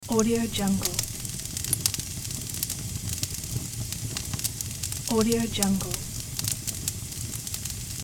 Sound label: fireplace; hollywood edge